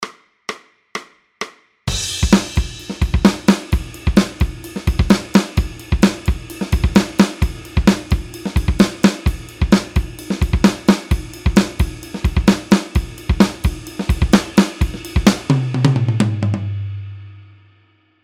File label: drum; logical